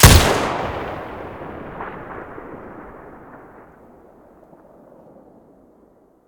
muzzle stereo, weapons; vpo101

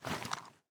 aim out, weapons; STALKER; IWP

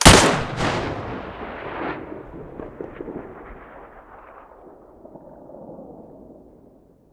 mosin muzzle stereo, weapons

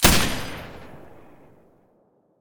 silencer M249 stereo

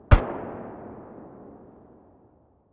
ash12 muzzle mono far, weapons; STALKER; IWP

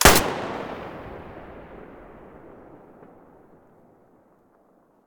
muzzle hpsa stereo, weapons

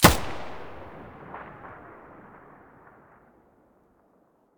muzzle; S.T.A.L.K.E.R.; IWP; vityaz; pp1901

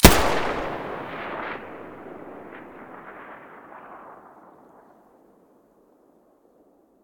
muzzle ump45 stereo, weapons

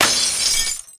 glass fall; STALKER; IWP

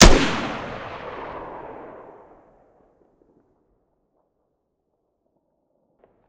rpk shoot stereo, weapons

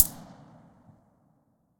silencer fn2000 mono far, weapons

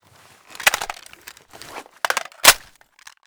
p90 reload, weapons

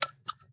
Shell 12ga grass; STALKER; IWP

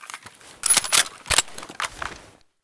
l85 misfire, weapons

